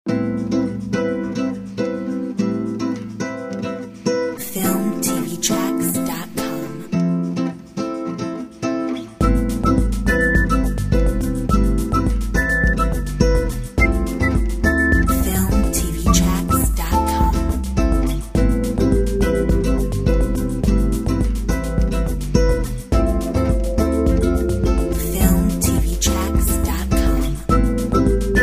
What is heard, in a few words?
Bossa Whistle